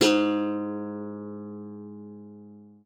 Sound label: G#2, guitar; Xsample VOL-2